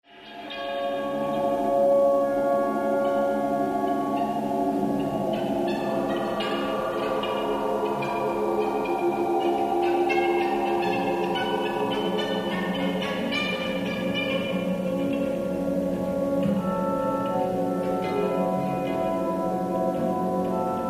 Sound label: bubbles